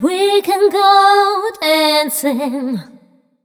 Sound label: f mel c, fem Vocals; Mutekki Media; Diamond Vocals 2